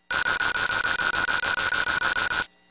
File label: Cart Key Lock; Momentum-Firmware